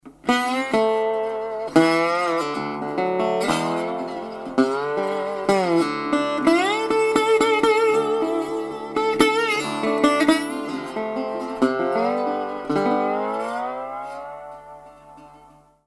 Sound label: Alabama, Delta Resonator Guitars; A cutaway tricone, 12 frets to the body and 25 3/4" scale lengt